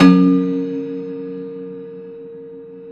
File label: Rubbery Piano, prepared piano; Prepared Pianos; Total Piano; Rubbery Piano 2 +ped